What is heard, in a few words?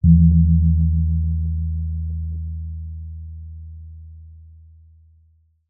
musical instrument gong gamelan hit soft